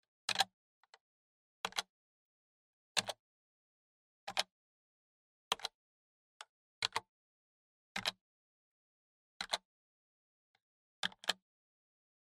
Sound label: PC Keyboard